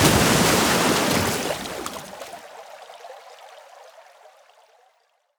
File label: win water gamble; DoubleTigersWD; wazdan